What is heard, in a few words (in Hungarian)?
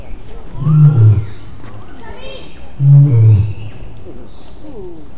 távolról kétszer, oroszlán; szegedi zoo 2011